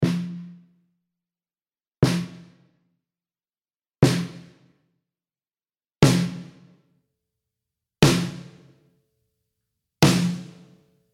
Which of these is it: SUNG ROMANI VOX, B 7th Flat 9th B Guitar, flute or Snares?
Snares